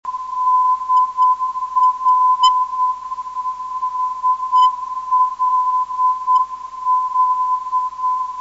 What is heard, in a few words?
7039.2khz